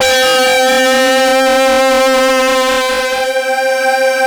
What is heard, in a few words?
LEADS; Masterbits; Vintage Voltage